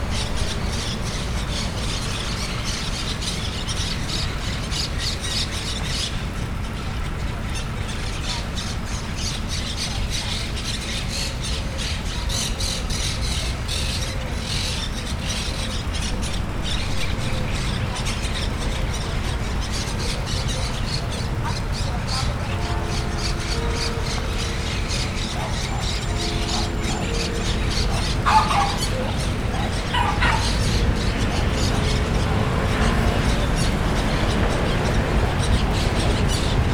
szarnyas vircsaft kutya jarmuforgalom

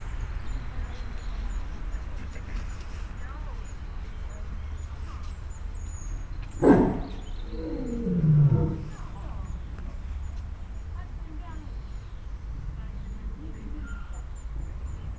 mordulas, oroszlan; állathangok; nyíregyházi zoo 2010

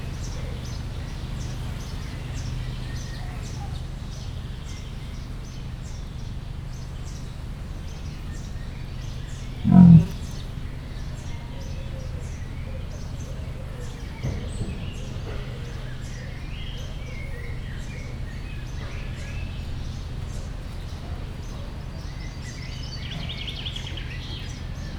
nagyon halkan egyet mordul, liheg, gépzaj, leopárd; jaszberenyi zoo 2015

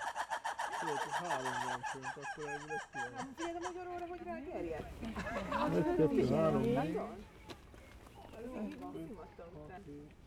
egyből rázendítenek, vörös vári; akg; mono; allathangok; veszpremizoo 2013

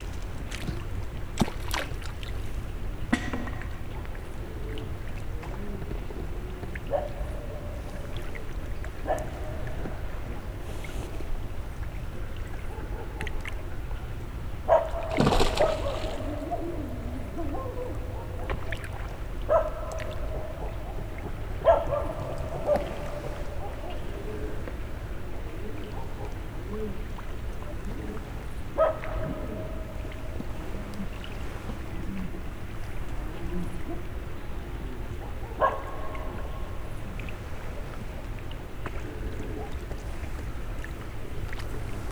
kacsa kutya gímbőgés halcsobbanás, tópart; termeszetben; allathangok

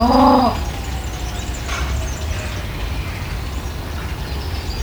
kulso zajok is, papaszemes pingvin; marantz puska; debreceni zoo 2012

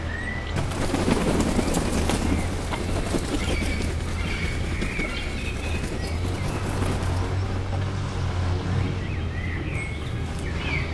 felrebbennek, tarvarjú; pecsi zoo 2017

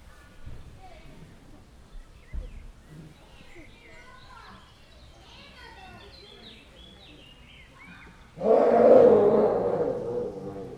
párzás szellő, bengáli tigris; gyori zoo 2014

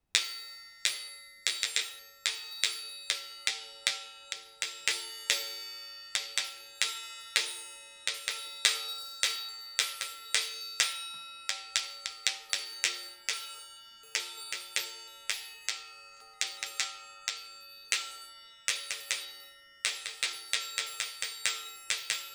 golyók egymásnak ütögetése; Perkupa; hangkeltés

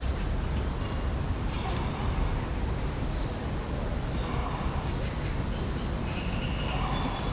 tapír, közönséges tapír; szegedi zoo 2011

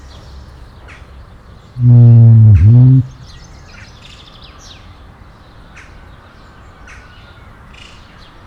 szembőlés közelről, nandu; kecskemeti zoo 2016

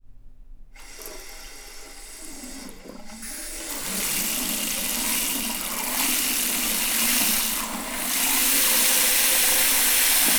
tobbszori allitas, mosdo; angyalfold

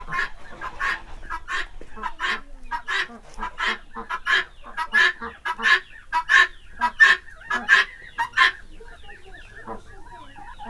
kacsa es csirkek is, gyongytyuk; állathangok; paraszt udvar

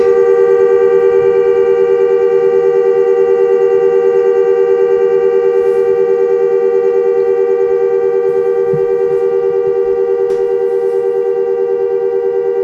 búgás kisebb zörejek, búgócsiga; Perkupa

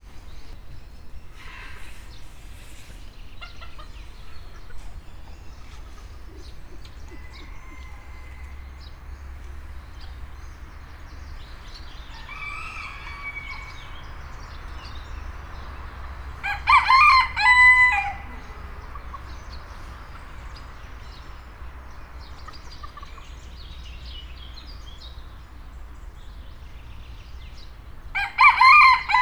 kakas tyúk fácán; állathangok; nyíregyházi zoo 2010; paraszt udvar

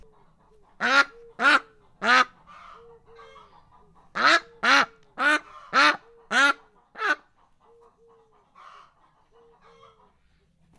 menekülőre fogja, házikacsa; állathangok; paraszt udvar

